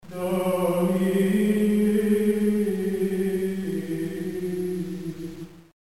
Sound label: chant